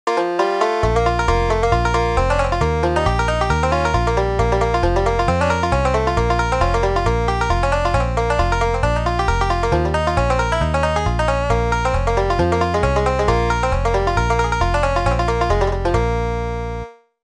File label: banjo; Nine Pound Hammer - 2nd Solo; hot banjo breaks 30 bluegrass jam standards